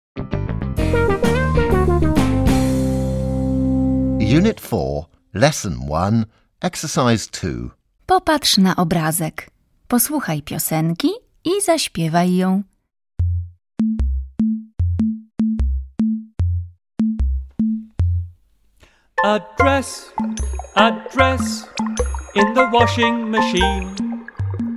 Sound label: washing maschine